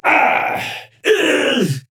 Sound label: Grunt Hit; paladins; Khan